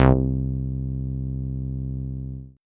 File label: Cr-78 Bass Longest, Dance